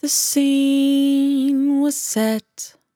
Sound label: 100bpm Bm, Velvet Vocal; Zero-G